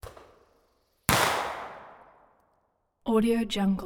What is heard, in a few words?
1 inch mortar forrest reverb